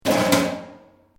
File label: METAL BOX; Metal (Sound Effects:Materials)